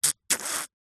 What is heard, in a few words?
human drink tea coffee sip slurp